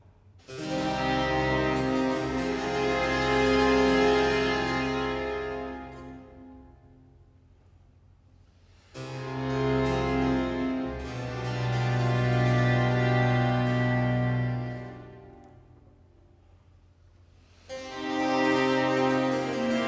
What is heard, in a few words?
Concerto 12 in F major 3. Adagio; Arcangelo Corelli; Corelli - Complete Works; Klassika